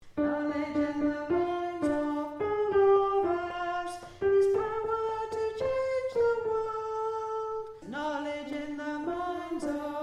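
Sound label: Soprano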